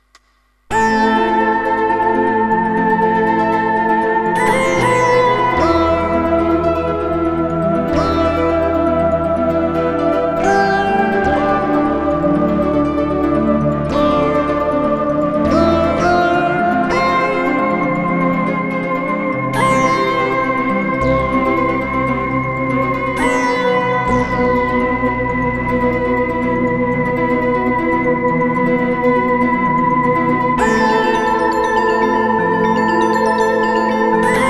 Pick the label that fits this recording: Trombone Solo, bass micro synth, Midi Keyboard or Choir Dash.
Midi Keyboard